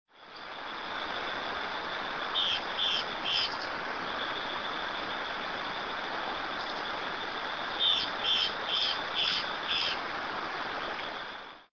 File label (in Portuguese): Rhopias gularis Choquinha de garganta pintada